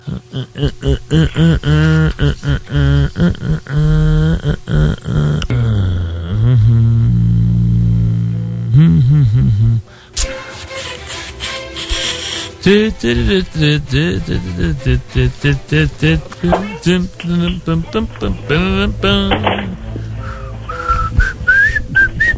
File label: Humming; WFAN; Super Bowl